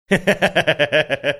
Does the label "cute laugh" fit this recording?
Yes